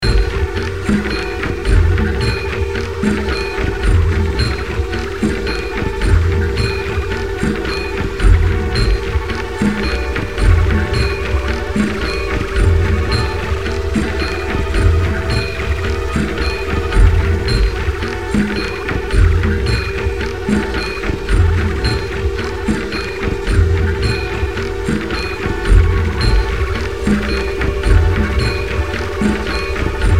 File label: Indian Meets Alien Slowed